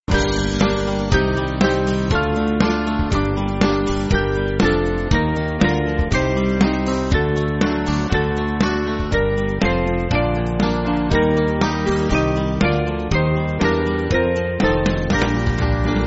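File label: Music Backing Files for Churches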